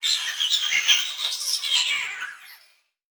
Production Element Title Transition Communication Transmission